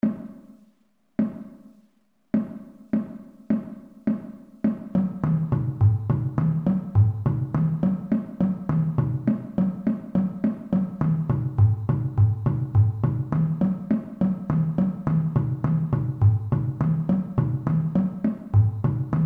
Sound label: bass 8's split, drum music; medium; marching; Warm-Ups